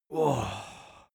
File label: cartoon male relief exercise